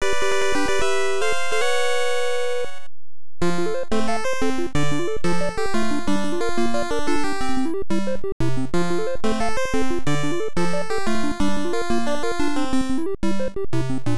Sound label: Bonus Round Start ~ Bonus Round; Mappy; NES